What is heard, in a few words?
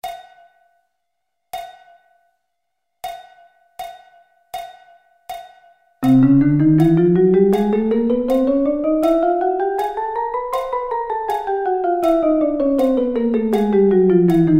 Chromatic Scale